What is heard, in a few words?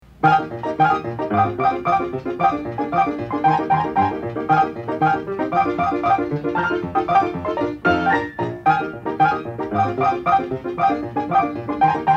Incidental Music; Dudley Do Right